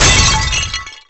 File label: pot break; sfx; Universo Animado